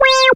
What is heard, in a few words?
syn c, Dance Synths, Acid Bubble